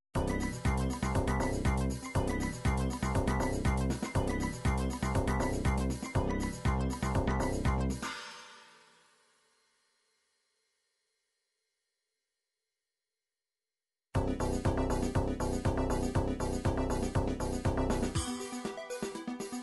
techno, midi

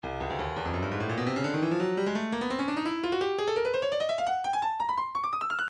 breakbeat midi files 140 170 bpm 170; Stylus

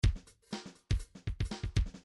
bdf midi file grooves funk misc funk misc

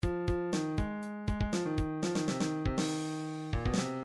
drums break, midi

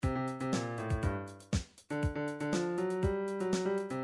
funk, midi

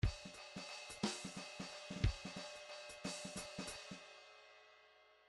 grooves 3 4

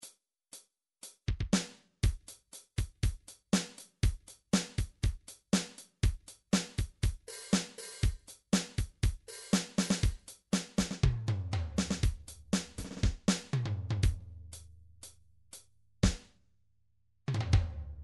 drums rock, midi drums

